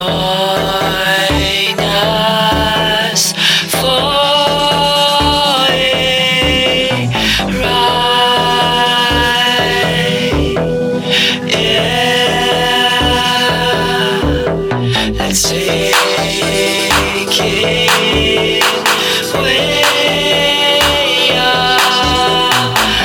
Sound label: singaporean song ringtone